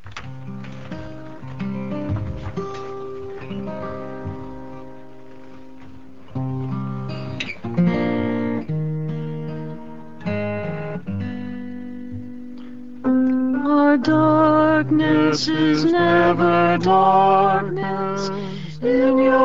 taize chant

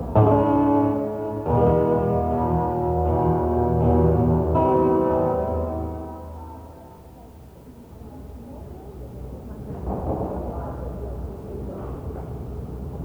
soundcheck

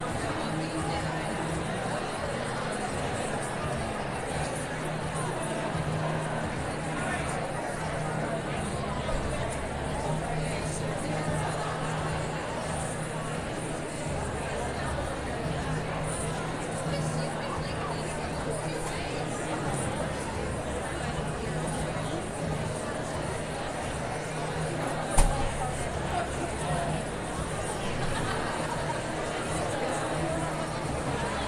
preshow crowd noise

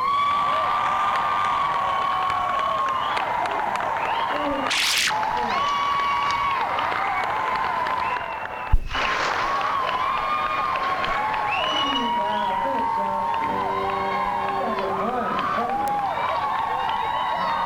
crowd; adelaide, australia

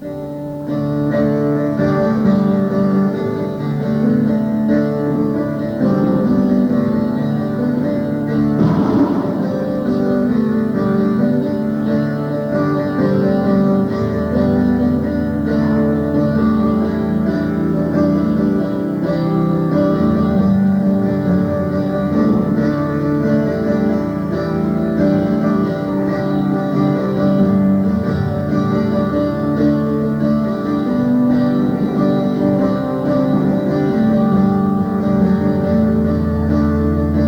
soundcheck

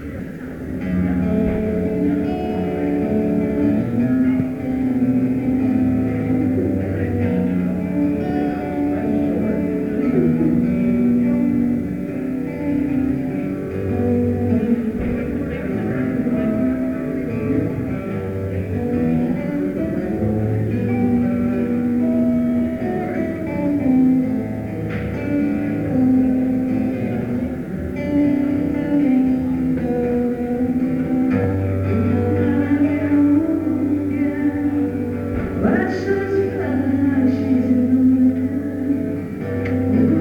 soundcheck

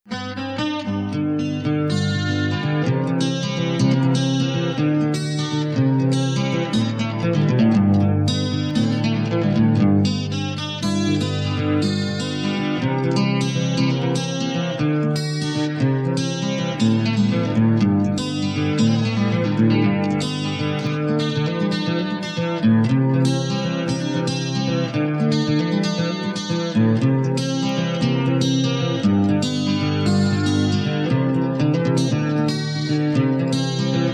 instrumental

